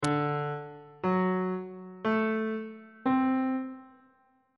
c flat phrygian 7th chord 3 is e double flat dominant 7th